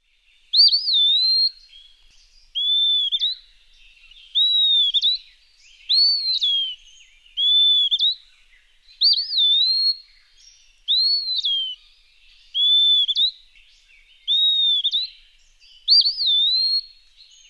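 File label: Eastern Wood Pewee dawn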